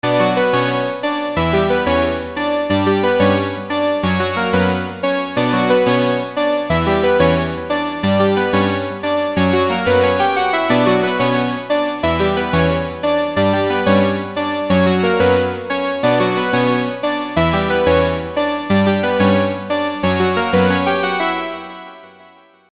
digital lead

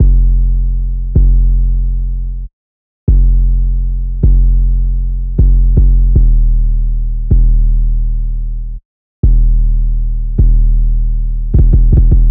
808 plugin off; analog glow; tonalmark

